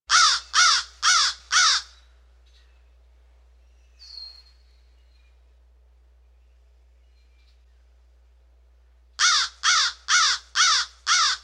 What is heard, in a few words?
crow calling